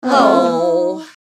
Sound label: Female crowd sad reaction